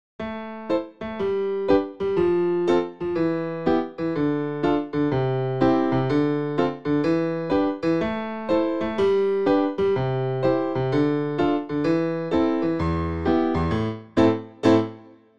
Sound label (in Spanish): escala